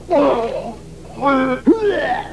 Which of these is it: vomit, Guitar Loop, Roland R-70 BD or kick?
vomit